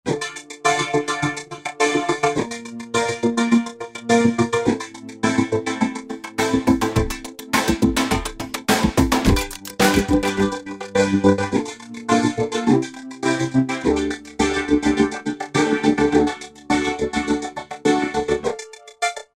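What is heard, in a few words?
Velocity Drum Vocoder, Korg Wavestation A-D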